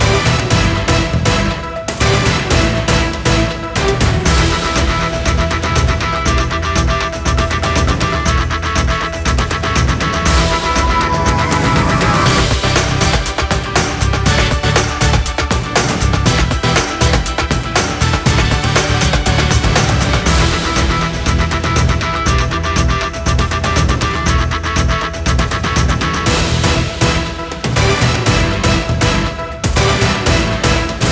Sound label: 120B